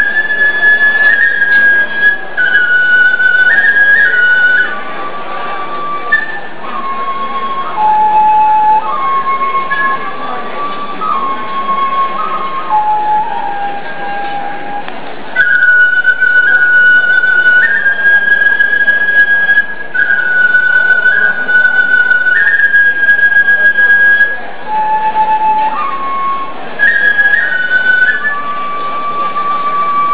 Flute and Cymbal; Banquet

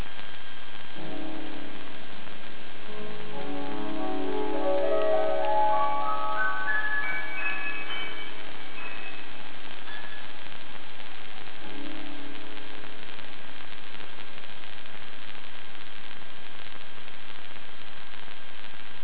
Singer; Tokyo Concert

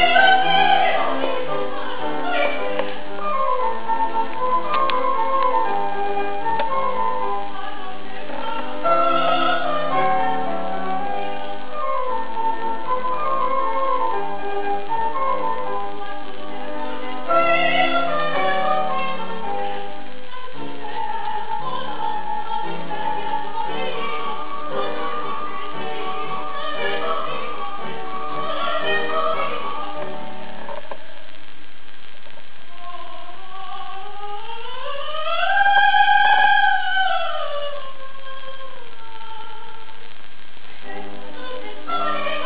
Two Pianos; Opera

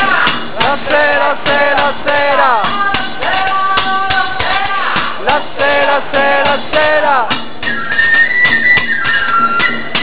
Flute and Cymbal; Banquet

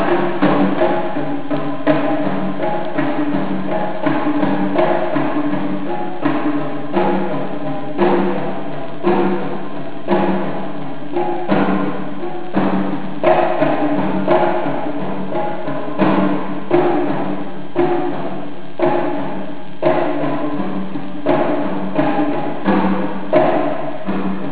Percussionist; Tokyo Concert

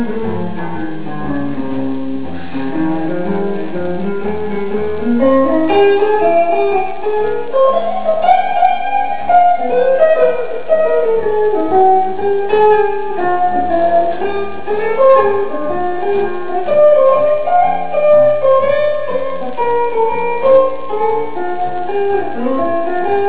Band; Utmatrikulering

